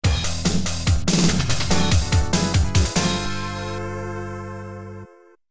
After Combat BGM (Internal Sound + Mercury Unit Version), x68000; Asuka 120% Burning Fest.